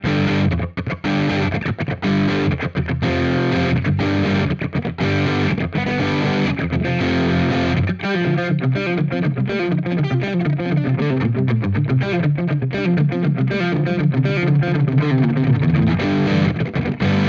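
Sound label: Hagstrom Ultra Max Special Mid Crunch, guitar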